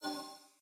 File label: command menu close